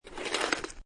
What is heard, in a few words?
Snow And Ice Ice Skate Slide; Weather